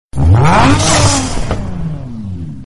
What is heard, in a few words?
car turbo